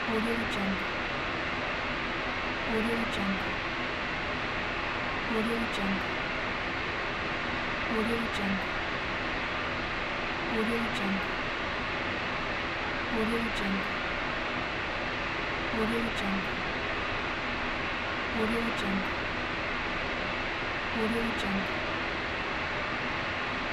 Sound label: noise